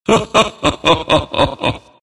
laugh

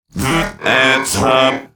Grunt Attack

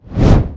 preattack; Dota 2; Doom

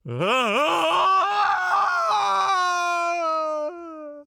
Falling; Khan; Paladins

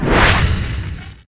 projectile launch; Necrophos

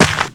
creep footstep; Dire

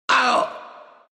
pain, ru; Dota 2

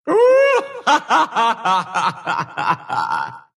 laugh, ru; dota2

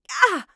Grunt Hit; Hunter; Twilight Huntress; Realm Royale

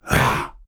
Knight Grunt Attack; Paladins

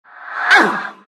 pain; death prophet

